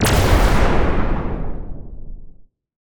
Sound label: laser; spacemap; lasers